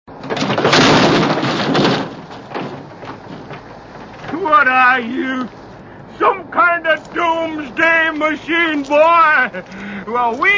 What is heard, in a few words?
secret agent